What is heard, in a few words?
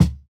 Kick, jungle breaks drum sounds